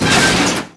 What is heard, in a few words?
Med Crash; PC Gamer IT CD 49; UrbanChaos